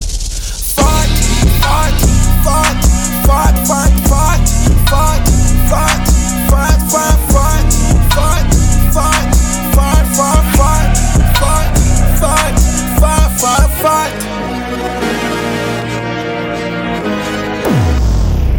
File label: Fart